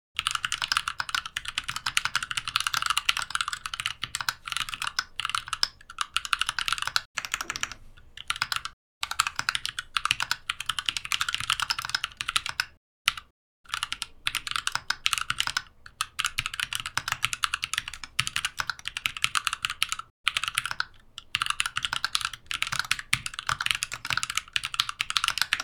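Teclado Mecánico Tecleando Mechanical Keyboard Typing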